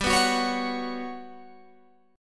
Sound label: G Major 7th Flat 5th G down Guitar Open G